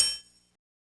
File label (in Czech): TLESK